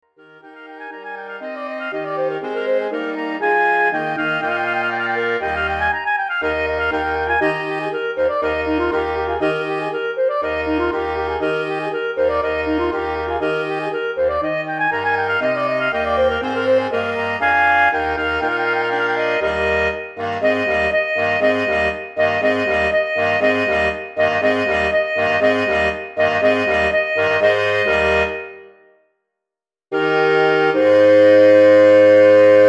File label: halleluja chorus QUARTET; Handel